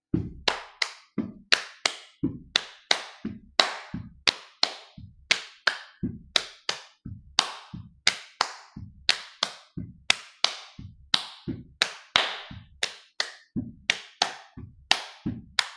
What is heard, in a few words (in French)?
Rythme